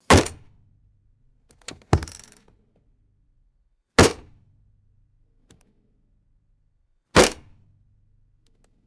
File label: pistol dropped on padded plastic dashboard; guns